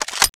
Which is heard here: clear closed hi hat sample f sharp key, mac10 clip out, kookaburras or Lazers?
mac10 clip out